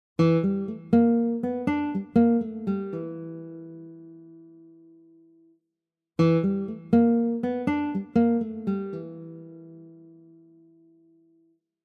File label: Guitars Stereo Image; PoeticGuitars 2